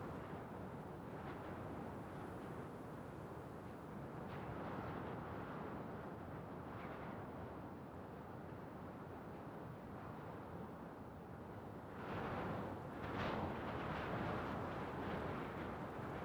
ambience desert windy; Rust

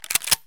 pump use; Escape from Tarkov

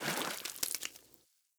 fish caught; Rust

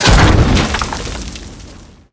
wreck impact

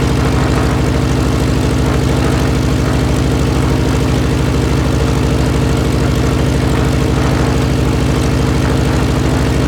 plane; World of Tanks

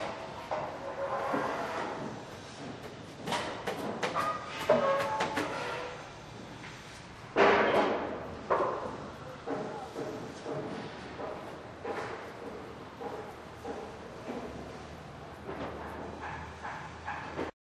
ambient port; World Of Warships; port3d

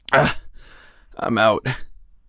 hurt near death; Escape from Tarkov; usec2

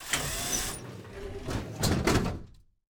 vending machine purchase; Rust

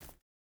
footstep barefoot concrete jump start; Rust

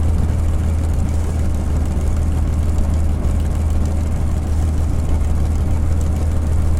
spitfire cockpit l, engines; War Thunder

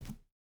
footstep hide wood jump start; Rust

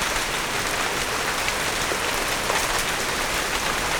ambient battle; World Of Warships

